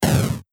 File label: Explosion